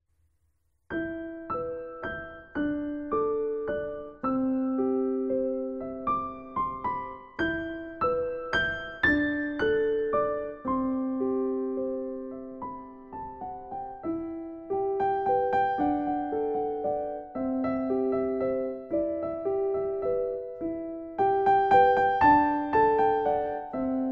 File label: Piano; پیانو نوازی میم مثل مادر